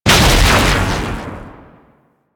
Explosion, Spear Gun; BE Ferrox

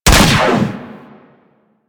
Fire Shot, Rifles; Snipetron

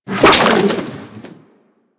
Kraken Tentacle Whoosh; Warframe; Warframes; Pirate

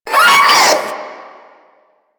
Vocal Short Attack; Proto Infested; Warframe; Infested Ninety Nine

